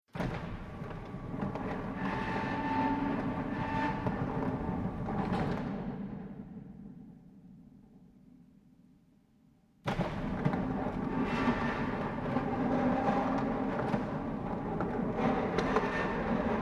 Industrial Mechanical Electric Door Large Mid Perspective Closing Vairations; Metal Door Sound Effects